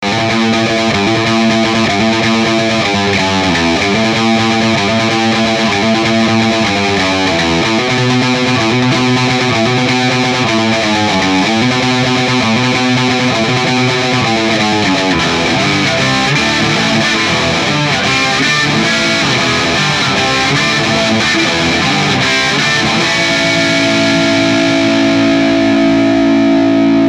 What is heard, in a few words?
riff